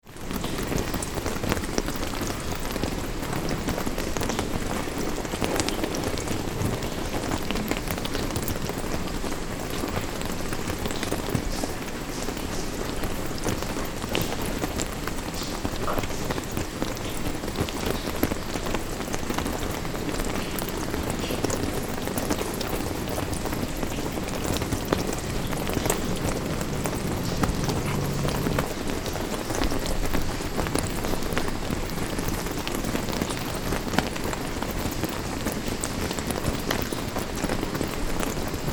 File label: sake brewing